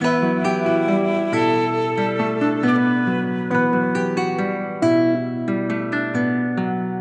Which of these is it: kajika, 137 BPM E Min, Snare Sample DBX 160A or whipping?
137 BPM E Min